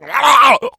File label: death, infected; npc; die; szf